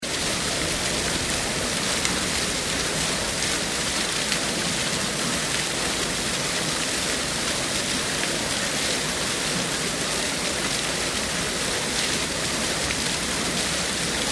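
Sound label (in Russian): shum fontana, fountain noise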